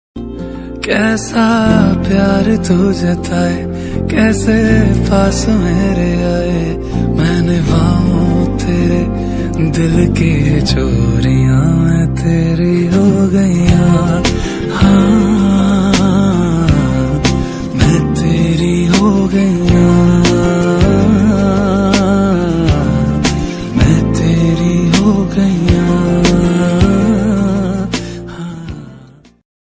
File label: hindi ringtone